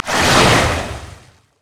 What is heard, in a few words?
surging tides; nami base